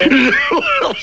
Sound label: death; tigger